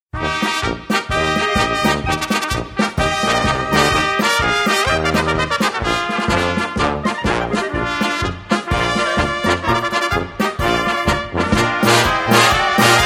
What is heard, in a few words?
Polka